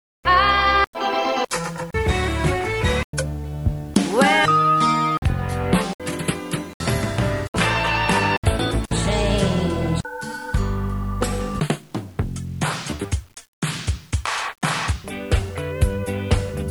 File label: music collage